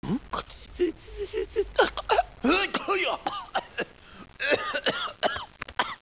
cough; quake2

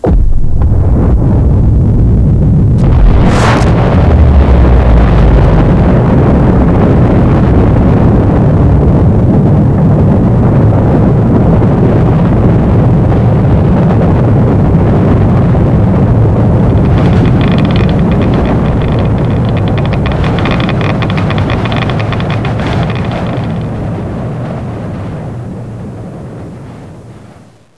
megaton nuke; quake2; kitsune

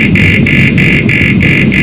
alarm; quake2

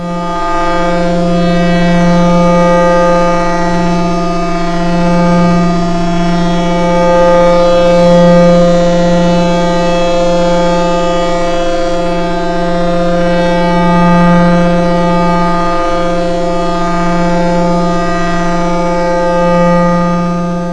electrical; quake2

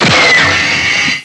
launcher; quake2; weapons

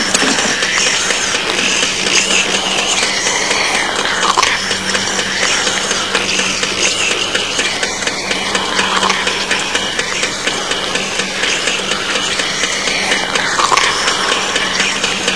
eerie clock; quake2

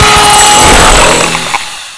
drill; quake2

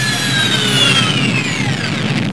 shutdown, jetpack; quake2

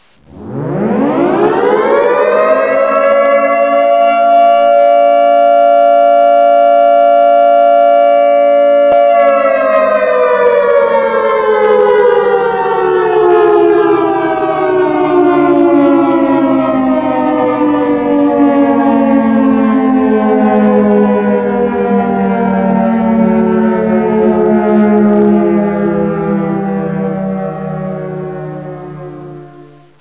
short siren; quake2; kitsune

